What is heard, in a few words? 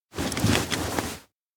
luggage rummage; awayuki; anemoi パッケージ版